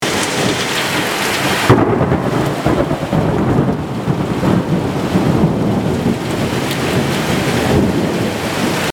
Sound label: Rain and thunder